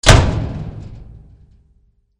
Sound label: DOORS